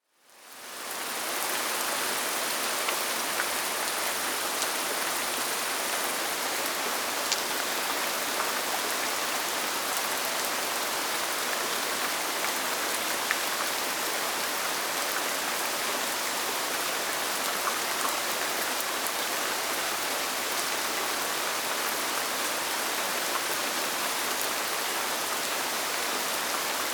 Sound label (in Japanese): natu